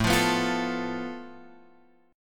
A Suspended 2nd Sharp 5th, Guitar, Drop D

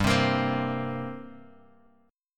G Minor Double Flat 5th, G; Gmbb5 Chord {3 3 X 3 1 X} strummed

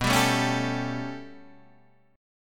B 7th Suspended 2nd Sharp 5th B, Guitar, Open D

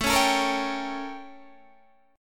A 7th Flat 9th Guitar Open D

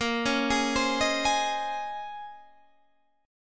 A sharp Minor 11th, down; arpeggiated

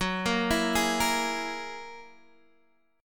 Gb 9th Gb down Guitar Open D

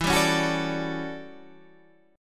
A 13th E, guitar chord

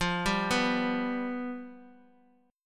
F Suspended 2nd Flat 5th, F

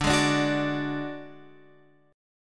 D Major Flat 5th D, chord

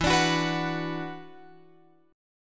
E 7th Suspended 2nd E down Guitar Open F; strummed

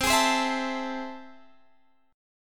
A Minor Major 7th, C

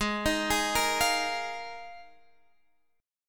Eb Suspended 2nd Suspended 4th, Ab

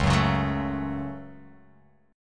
Db Suspended 4th Sharp 5th, Guitar Open F; strummed

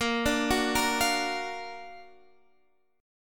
F sharp Augmented Major 7th A sharp, chord

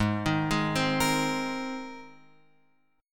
Ab Major Add 9th Ab, Guitar Open F; arpeggiated; x,3,3,3,3,5

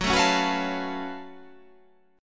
F sharp Minor Major 11th; chordshape; down

